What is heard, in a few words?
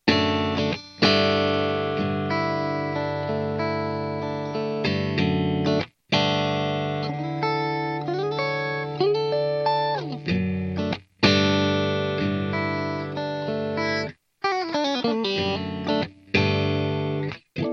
guitar